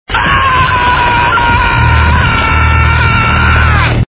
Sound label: scream; courage